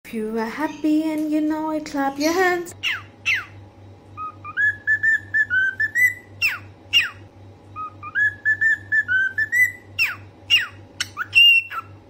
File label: Cockatiel; عروس هلندی